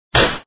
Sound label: slap; pencak silat 2 2002 gamerip online